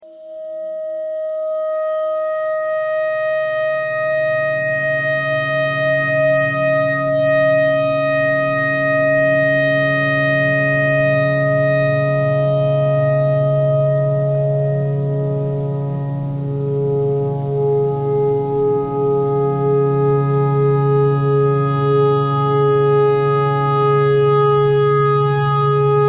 tornado siren clarinet notes e thread simple

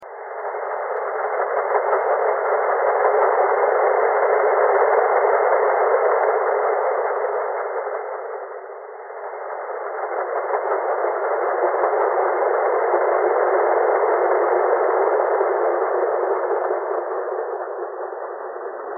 thread simplebuzz

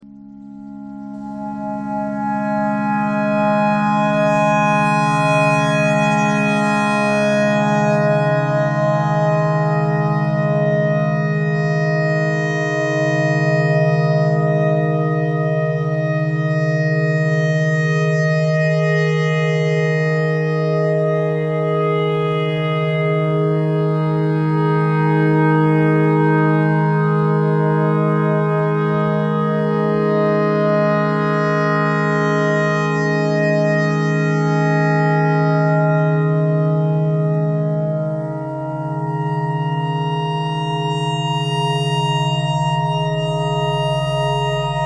clarinet notes e clarinet simple all

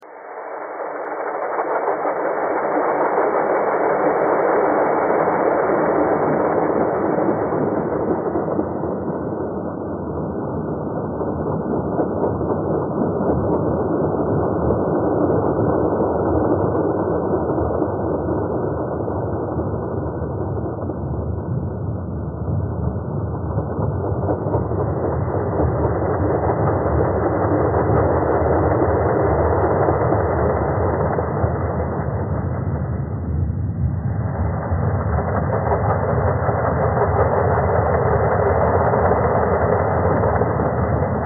thread simple

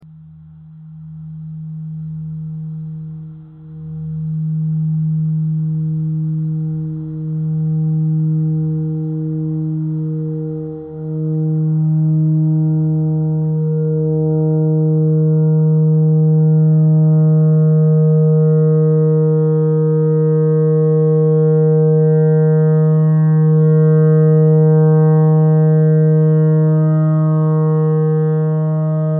clarinet notes e clarinet notes e thread simple

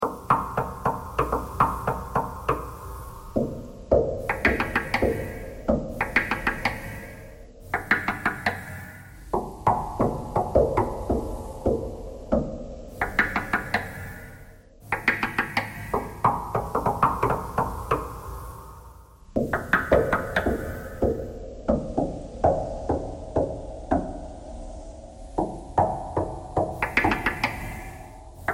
knocking thread noise

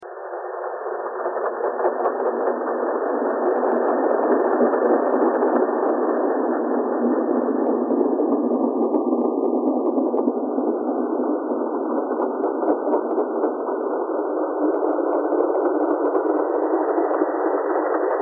thread buzz

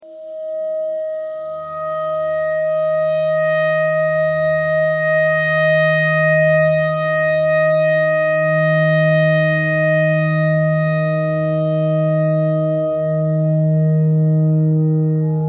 clarinet notes e clarinet notes e pentatonic

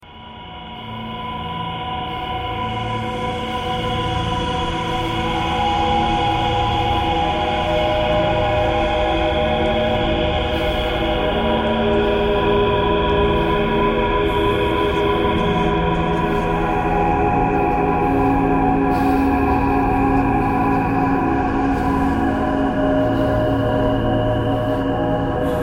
thread simple

